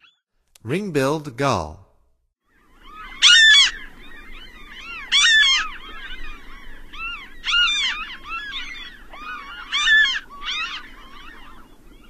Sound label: Ring-billed Gull; North America; Stokes